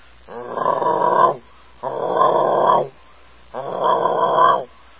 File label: bullfrog